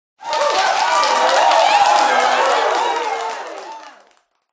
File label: game success